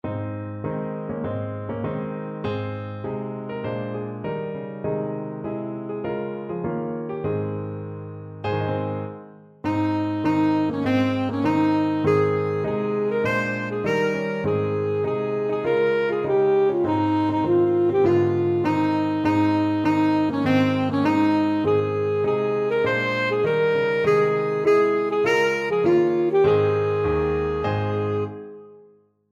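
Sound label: alto saxophone; e rere taku poi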